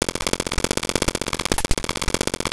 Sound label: Rhythm